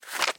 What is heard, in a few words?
shuffle